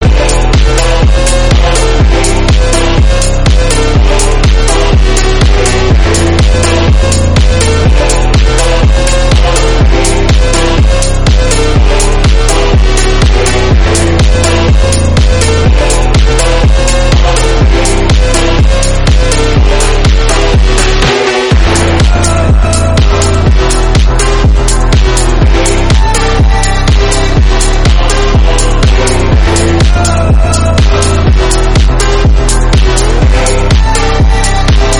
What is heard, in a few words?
phonk